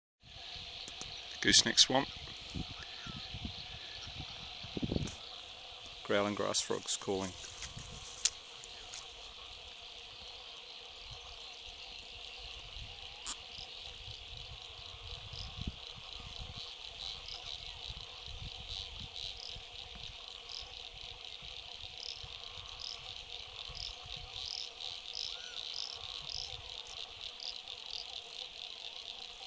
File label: Growling Grass Frogs Gooseneck Swamp